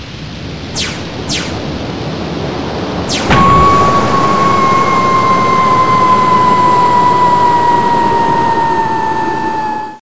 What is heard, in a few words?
space hit; XMEN2